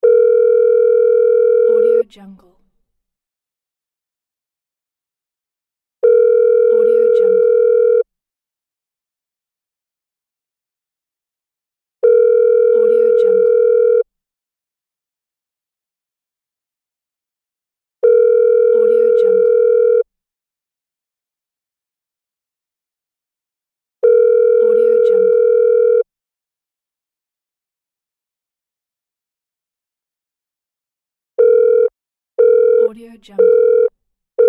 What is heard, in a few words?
phone ringing dial tone